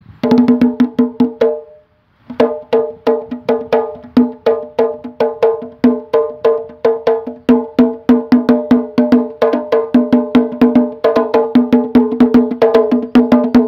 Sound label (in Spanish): tambor